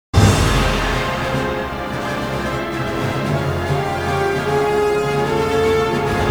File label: Loop